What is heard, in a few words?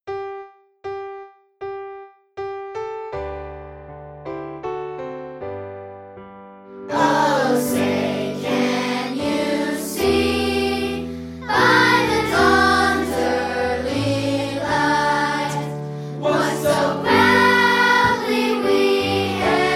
Star Alto, Choir